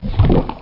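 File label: AMBIENT LAVA; PwrSlave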